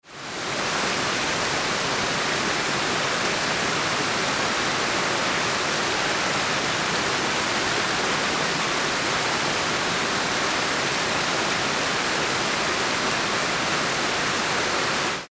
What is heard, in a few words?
waterfall